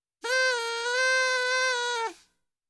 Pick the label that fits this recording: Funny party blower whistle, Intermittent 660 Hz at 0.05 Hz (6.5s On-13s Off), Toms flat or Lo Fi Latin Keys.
Funny party blower whistle